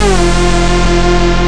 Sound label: synth